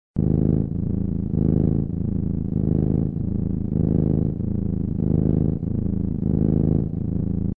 cat goro

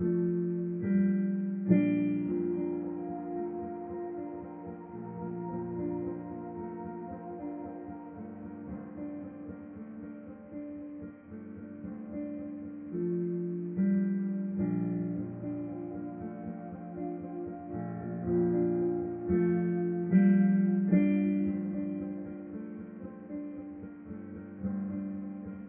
Piano; ゆめ2っき

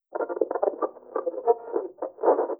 radio tune

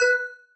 marimba; ゆめ2っき

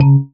marimba raincoat; ゆめ2っき

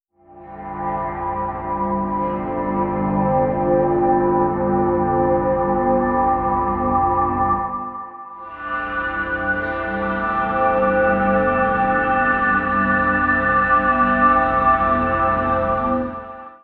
Drone; ゆめ2っき

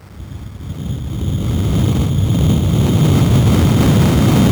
fade in; ゆめ2っき

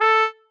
marimba trombone; ゆめ2っき

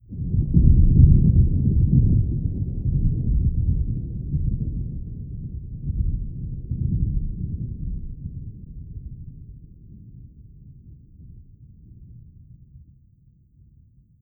thunder

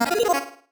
marimba dice; ゆめ2っき

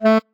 marimba bat

